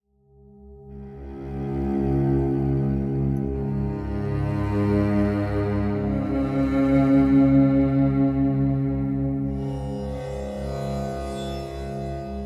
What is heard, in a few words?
Yoga OM Ocean